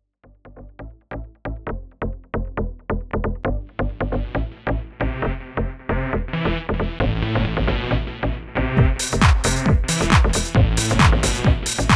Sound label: Instrumen